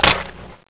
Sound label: box out; cso; skull6